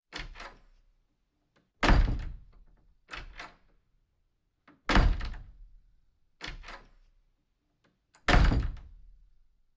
heavy door close

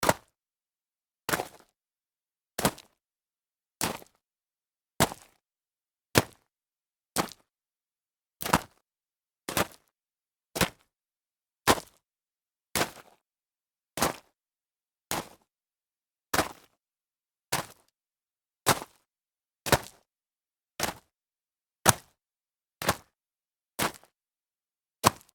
stomp in gravel, foley

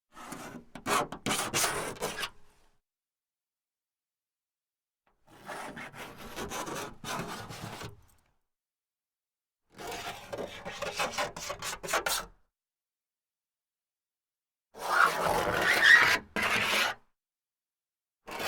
keying car

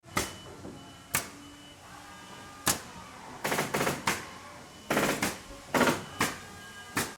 air nailer wood

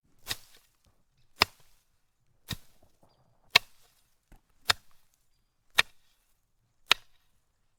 cutting through foliage

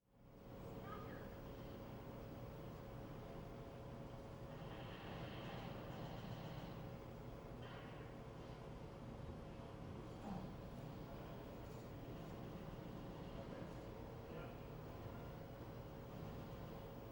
arcade room

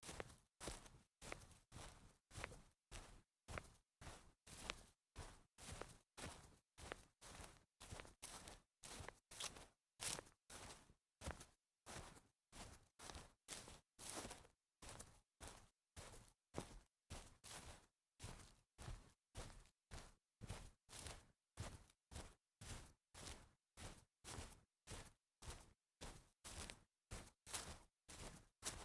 walk on grass series

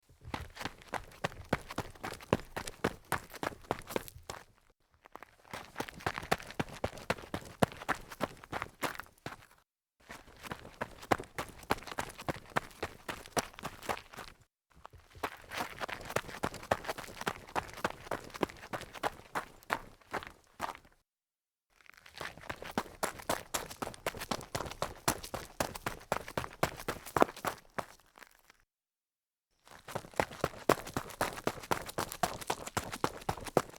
sneakers run gravel, foley

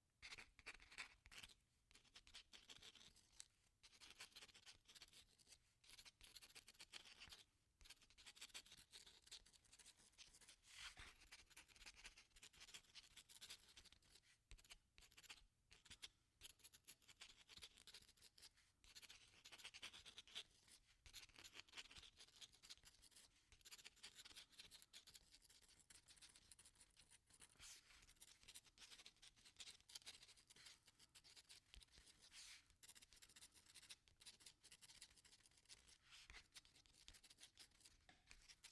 pen writing

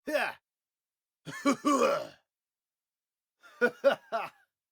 male laugh

